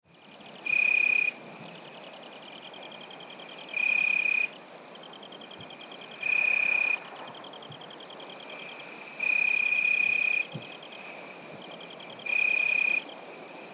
Forest night ambience crickets cicadas and insects